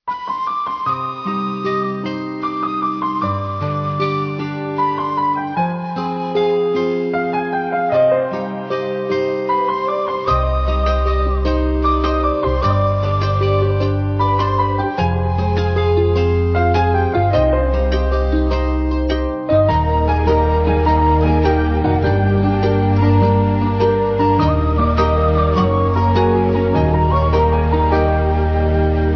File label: love bgm